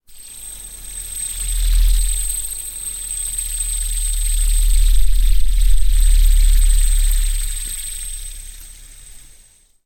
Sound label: hand spinner; sonniss gdc